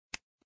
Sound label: click